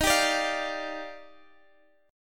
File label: Eb Major 9th Eb